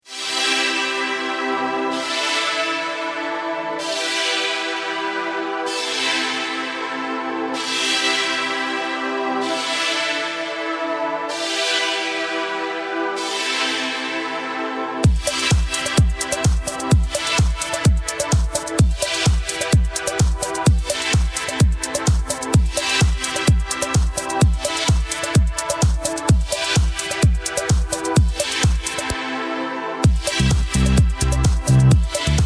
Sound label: Funky Beats Technodrome